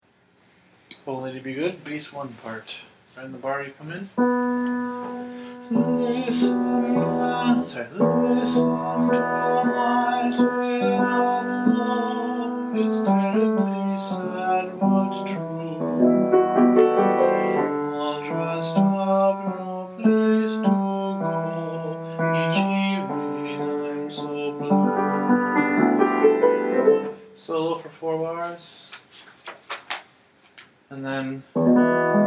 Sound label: bass